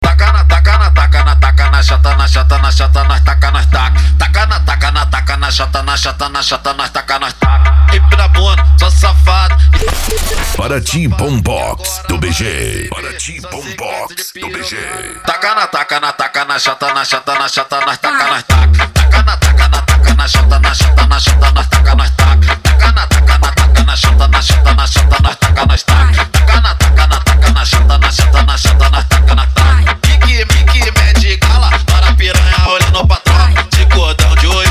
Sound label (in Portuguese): bombox funk